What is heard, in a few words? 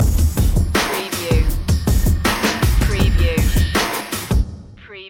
broken scope sting